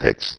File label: LoFi Vocals Oneshots; Vengeance Samples